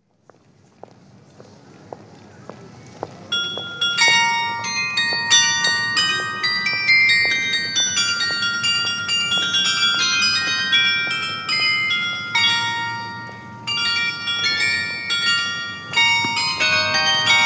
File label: carillon